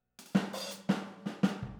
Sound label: Boogaloo - Overhead ST, 82 BPM; Drums; Intro; Sonic Foundry; Drums from the Big Room The Mixes